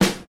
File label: 00s clean steel snare drum sample c key